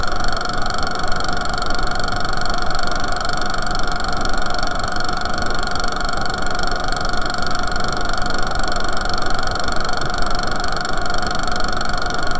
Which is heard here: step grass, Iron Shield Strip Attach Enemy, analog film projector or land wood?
analog film projector